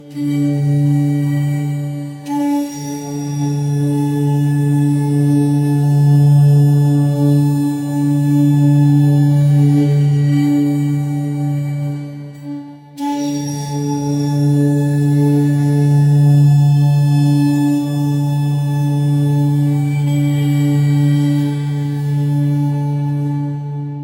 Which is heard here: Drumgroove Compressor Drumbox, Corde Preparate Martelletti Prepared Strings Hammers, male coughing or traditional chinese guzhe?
traditional chinese guzhe